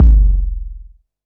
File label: 808 BD long, Roland-808 Drum Hits Bass Drum